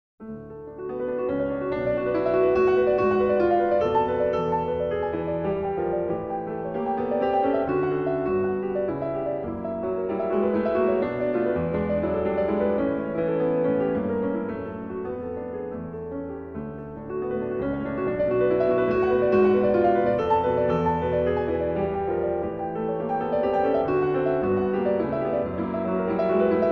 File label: Four Piano Pieces Op. 1 - Allegro Con leggerezza; edward Grieg